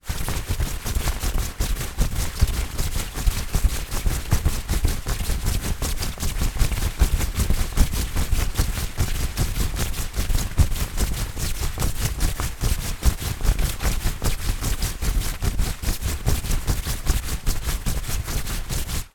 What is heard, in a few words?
foley clothing shorts sports movement sprinting or fast exercising